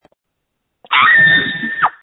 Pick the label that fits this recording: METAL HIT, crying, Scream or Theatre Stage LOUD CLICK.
Scream